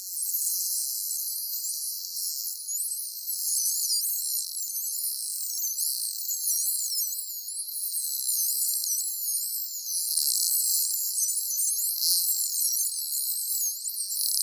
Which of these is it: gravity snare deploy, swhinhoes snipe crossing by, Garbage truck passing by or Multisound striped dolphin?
Multisound striped dolphin